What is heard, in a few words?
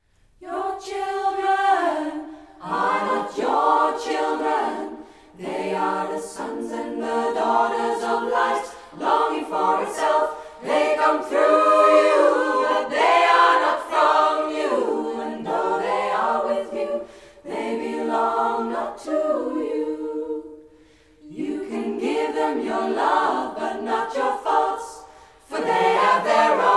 ssaa